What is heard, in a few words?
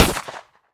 Shotgun Shot; gamerip; shelter 69